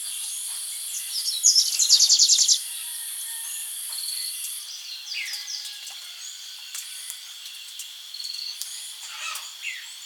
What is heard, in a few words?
Variable sunbird